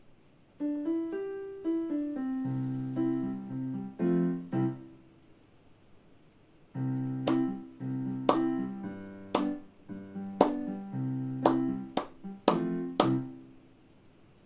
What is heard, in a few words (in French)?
alto